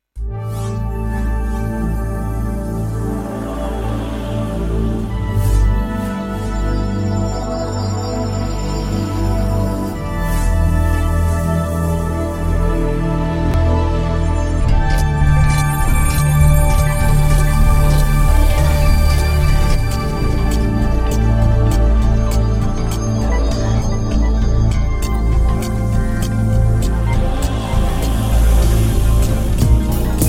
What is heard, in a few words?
Chromium 3D Pad; Combi